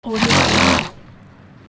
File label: horse or donkey sneeze